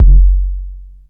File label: huge kick one shot F# key, Royality free steel kick drum sound tuned to the F# note; 90s; F sharp; kick drum samples